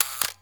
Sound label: Camera